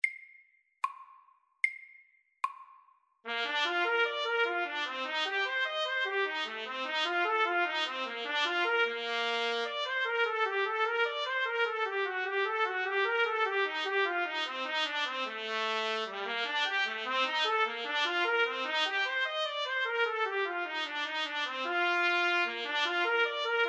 Akkord etyde FORT

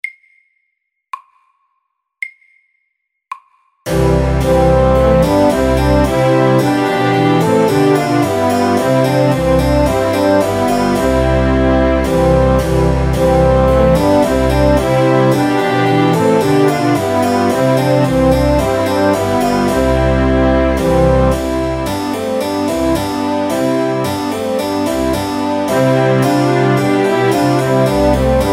Trumpet tune MIDDELS

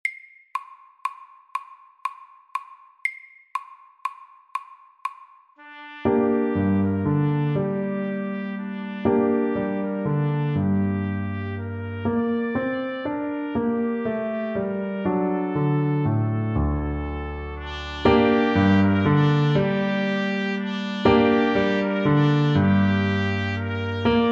Bugle horn SAKTE

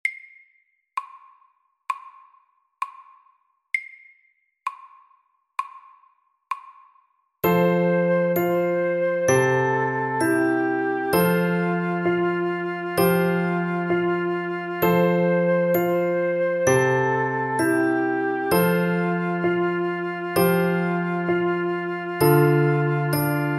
Vuggesang SAKTE

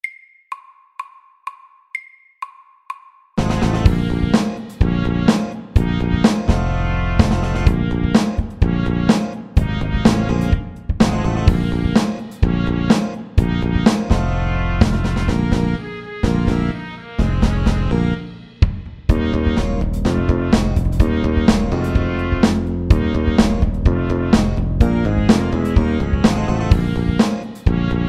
Funky FORT; Funky monkey

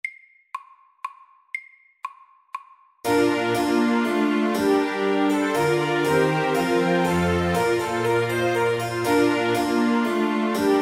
Menuett MIDDELS

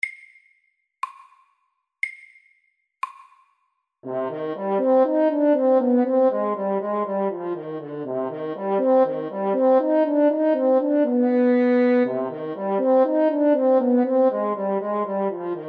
G moll etyde SAKTE

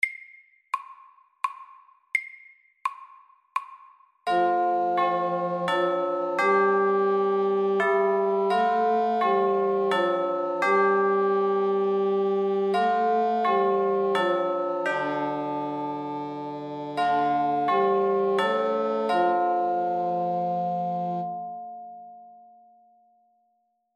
Klokkekling

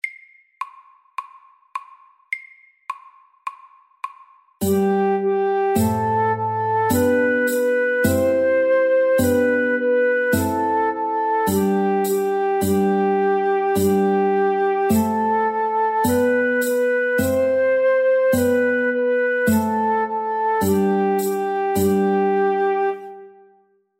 Folketone MIDDELS

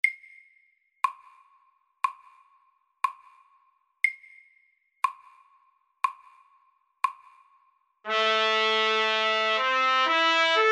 Duett i H moll MIDDELS

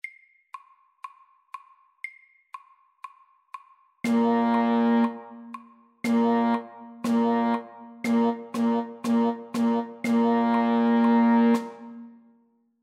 Rytme 3 slag i takten Pa slaget FORT

